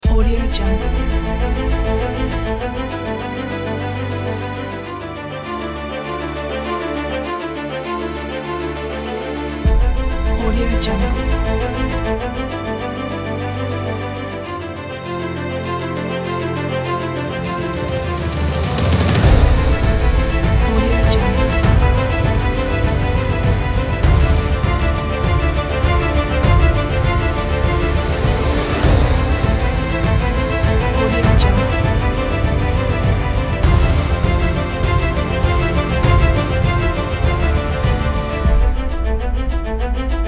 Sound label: epic